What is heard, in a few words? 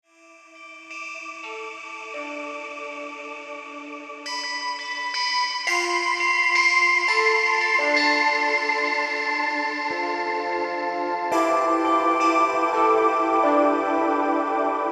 Bells With Movement, Ambient Bells